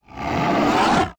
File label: drakin breath in; haggy wuggy bot